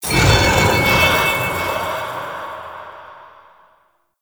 Sword Parry Stun; Warframe; Duviri; Dax; Weapons

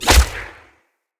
Hunter Bow Ranged Shot Variant; Weapons; Player; Envoy; Bows

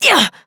Exert Adult, Melee Swing; New War; Operator

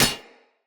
Short Sword Medium Impact Armour

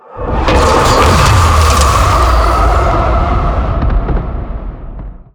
Tau Sentient Vessel Scream; Warframe

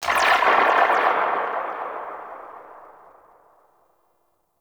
Sniper Rifle Tail; Wraith Grineer

